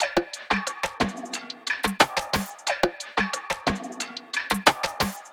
Tropical Perc Loop - 90 BPM; Cymatics; Fiji Hihat Loops & Percussion

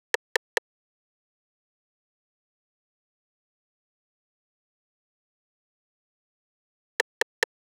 Drum Loop 70 BPM Percussion; Cymatics; Vibes II Drum Loop Collection; Dialed Drum Loop

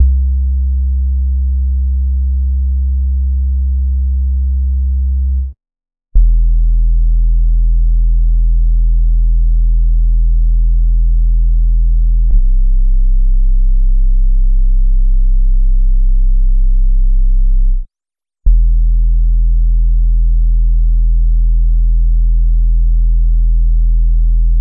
Synth Bass Em 78, Lo-Fi Loops; HipHop; Melody Kits; Ghosthack; AC2024 - Day 5; Kit Memories

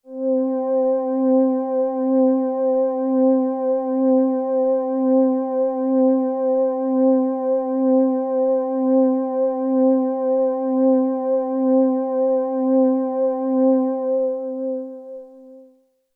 Juno 106 PAD Moon Low C; Synth; One Shots; Cymatics; Fantasy Synth Sample Pack

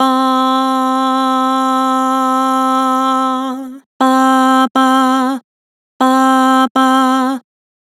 Vibrato "Bah", Vocals; Harmor Vocals

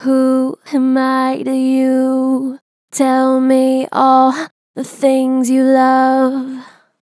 Strong Vibrato, Natural Vibrato Strong, Vocals; Harmor Vocals

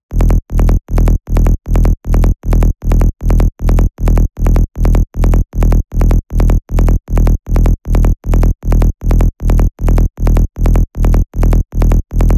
Bass E 155BPM, Rave; Techno; Melody Kits; Stems; Ghosthack; 7 Free Rave Melody Kits 2025; Kit Ritual

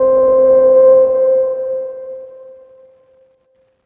Prologue KEYS Crystalline Low - C; One Shots; Cymatics; Fantasy Synth Sample Pack

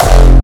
Kick; Harmonizer

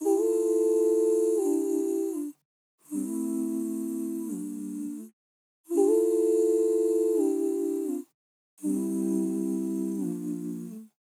Vocal Dry 84 BPM G Min; Cymatics; Infinity Vocal Collection; Angels Vocal

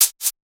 One Shot Perc, Deep House; Count Perc; Ghosthack; Advent Calendar 2019

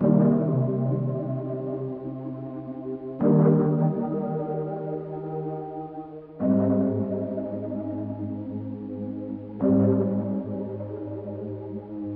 150 BPM C Min Pad; RnB; Loop Stems; Cymatics; 2022 Melody Collection; RnB Pt 2; Love You So

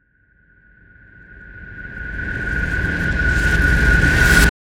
Riser

